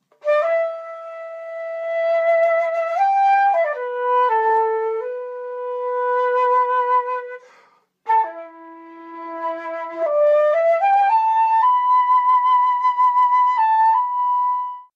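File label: shakuhachi Japanese flute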